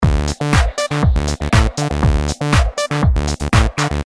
synth

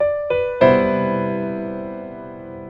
Cmaj7 part

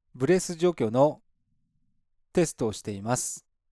Breath processed

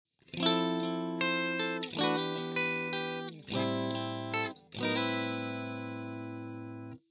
Clean

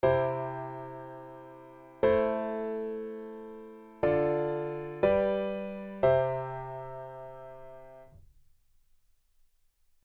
chord progression

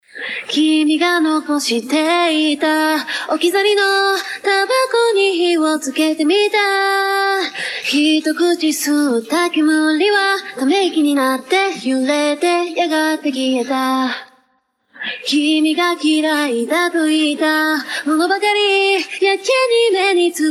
vocal; RX10

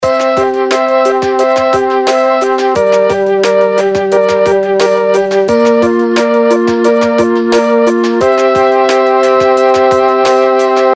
mellotron

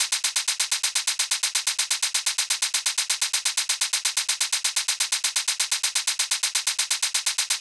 Hat before

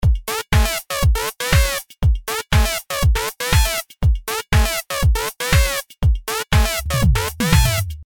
Lfo

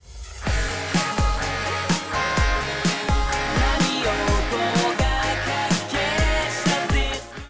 Tape Before

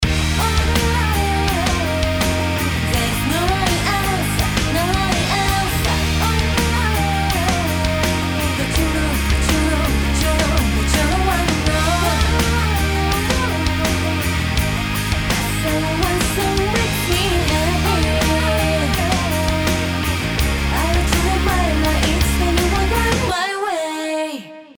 limiter on

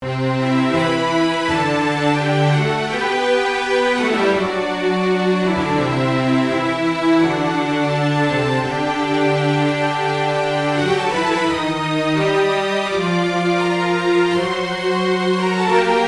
strings

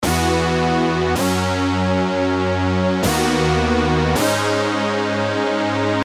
Future Bass

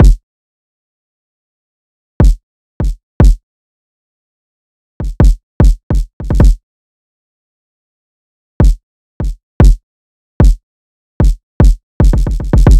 Drill Kick After

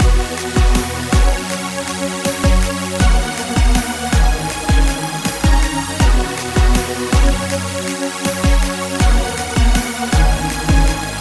Backing

